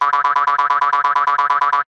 cartoon spring boing jump jaw bounces hops many fast high pitched